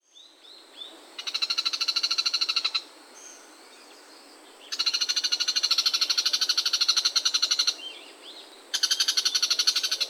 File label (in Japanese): ギチギチ鳴き; 川口市